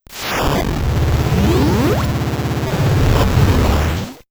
death sequence ship

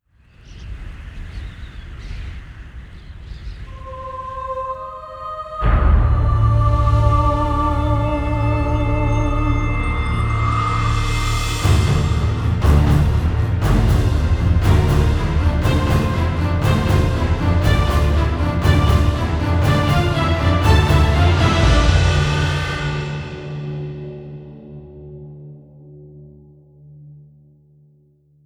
Round Start; Zombie Der Riese

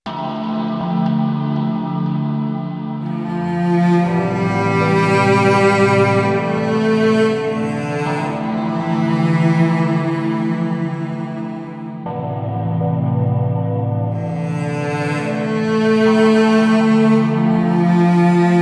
Easter Egg Stinger; Zombie DLC5 - Tomb

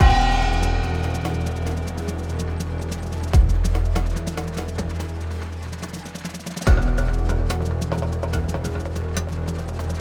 Fight; Hideout2; ScoubidouCorridor

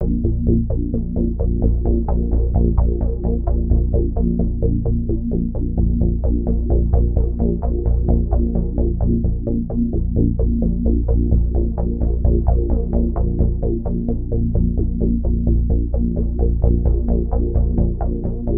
Arena Start; BrainBread 2

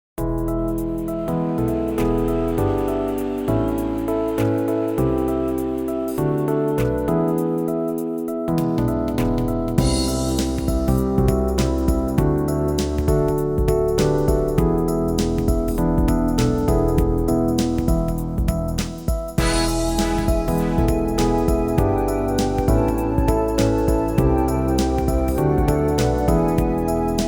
Uplifting Scene

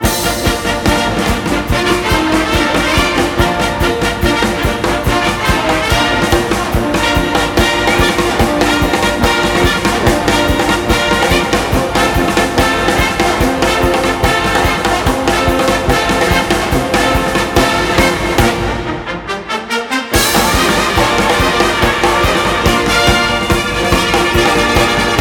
Broadcast Outro; NCAA Basketball 09; PS2, PS3, Xbox 360

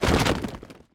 dirt break; teardown; ps5 windows xbox series xs

